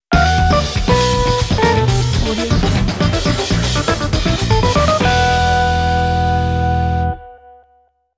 stylish logo

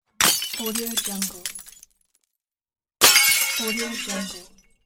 glass crash

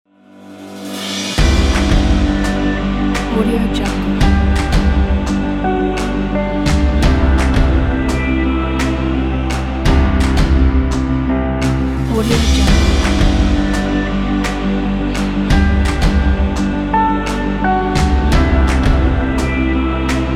cinematic indie rock

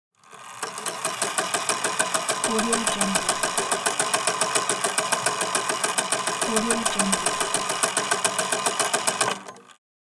wind up; moonaudioproduction

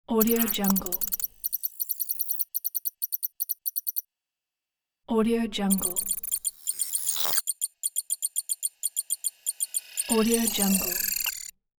holographic map particles

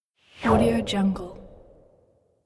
whoosh swoosh

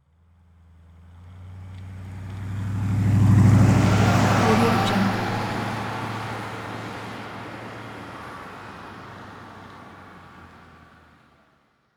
suv passing by

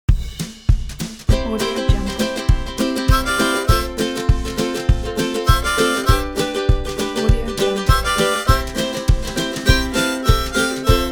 happy harmonica; nikoevans

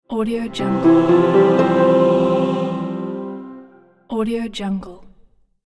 heavenly production element; sonic-boom

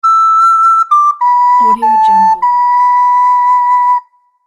medieval flute

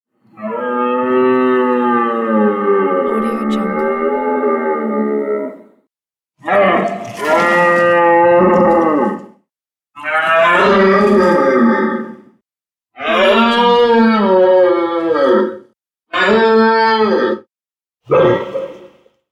deer vocals calls bellows

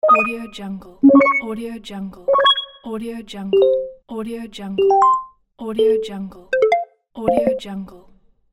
interface beeps bleeps

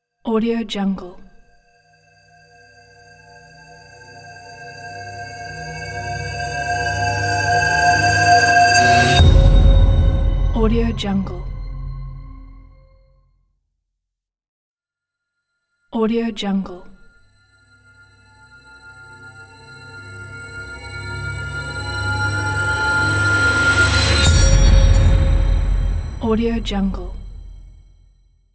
bell rise

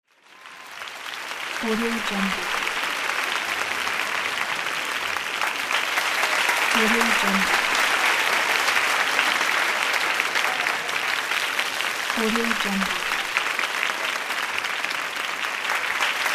applause

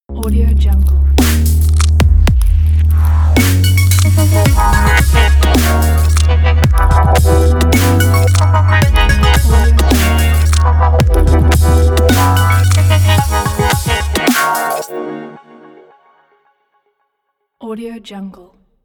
future bass hip hop logo

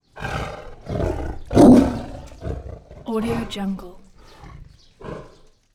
tiger roars

